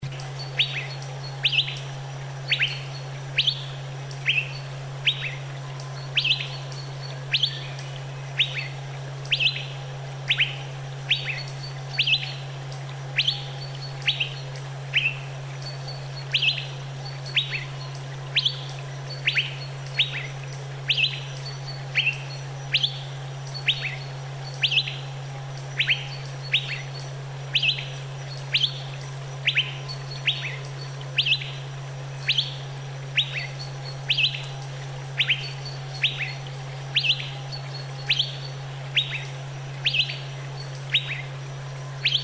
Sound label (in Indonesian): suara terapi cucak biru